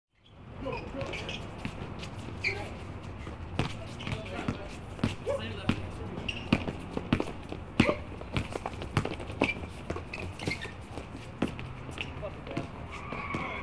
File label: Basketball Game General Atmosphere; KMP Film Video Sound Effects